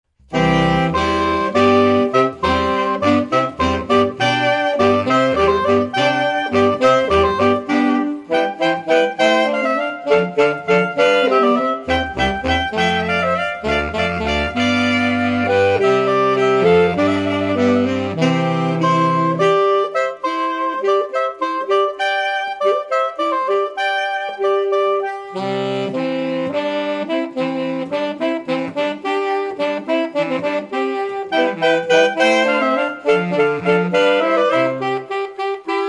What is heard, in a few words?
water music